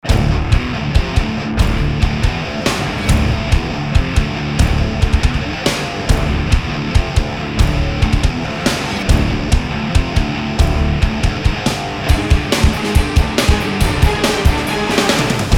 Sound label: hard and heavy no vox